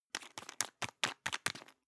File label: toothpaste screw cup close fast hard; Bathroom; haus-garten; badezimmer